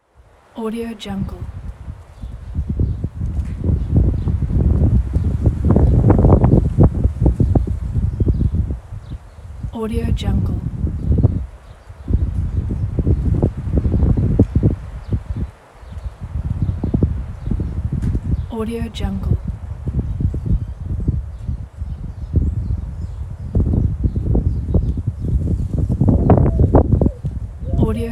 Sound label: wind blows into microphone of video camera countryside ambience